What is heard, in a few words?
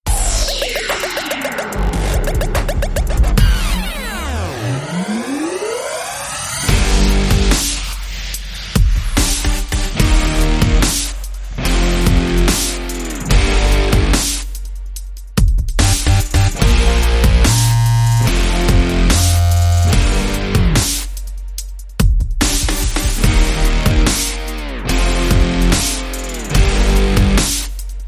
Less Dubstep FX